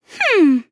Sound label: hmm; shirokuma